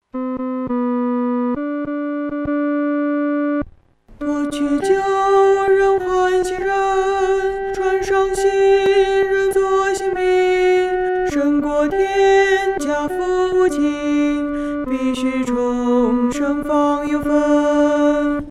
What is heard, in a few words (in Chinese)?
独唱（第二声）; 除旧更新